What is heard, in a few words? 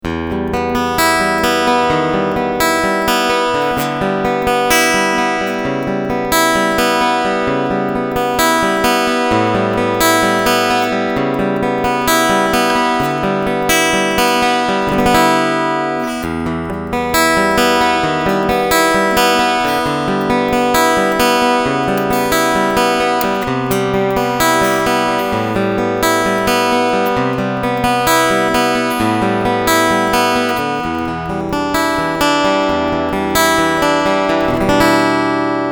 Picking Preamp